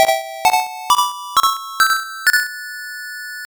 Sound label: Mystic Harp; master system; Spellcaster